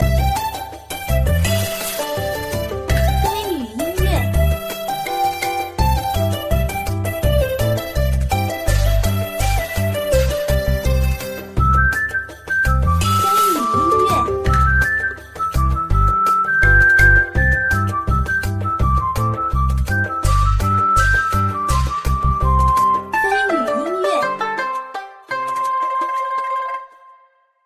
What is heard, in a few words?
music back